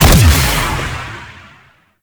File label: Missile Swarm Fire; Warframe; Corpus; Corpus Railjack; Spec Ops Arachnoid